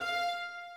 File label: High Violin